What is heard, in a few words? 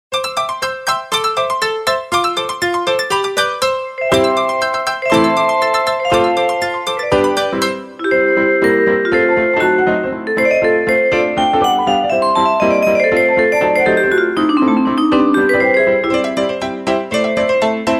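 cheerful children's ringtone, mood-lifting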